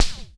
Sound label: OINK; Spectrasonics; Liquid Grooves; WAVDRM HITS1